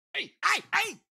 town human road; Blind Drive

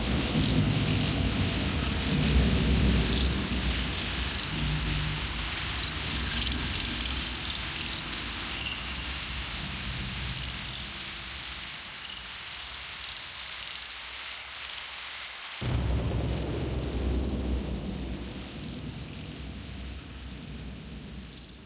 THUND; XXL 800 Production Effects

